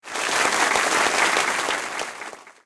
Applause

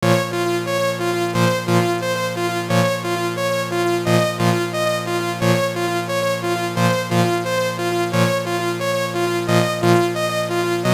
BGM; Meitantei Conan Majutsushi no Chousenjou; WonderSwan

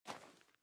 step sand

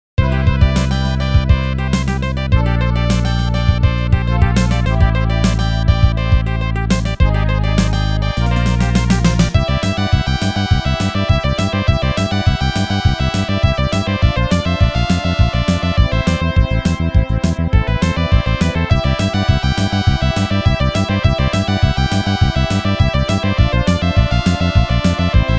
Air Battle BGM; Gradius

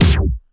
dj disco effect 1beat; Plants vs. Zombies Battle for Neighborville

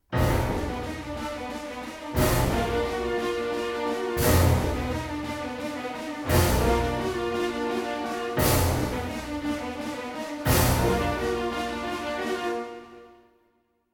suspence short; Chrome

